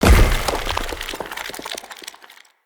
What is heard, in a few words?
Turn To Stone Loop End; Powers; Fey